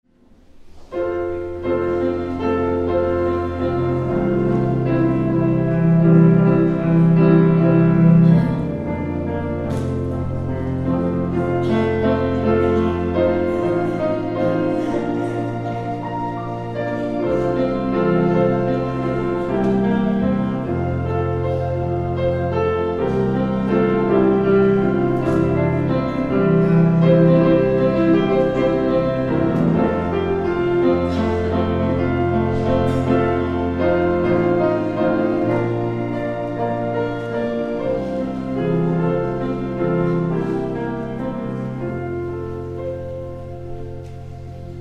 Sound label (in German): Vorspiel